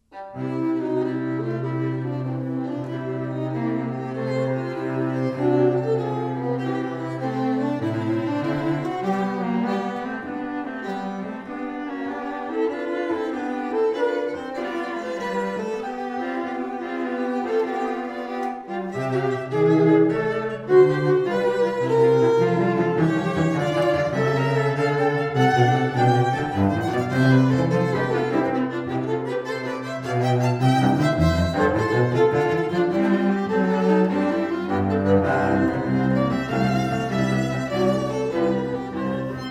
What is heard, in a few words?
trio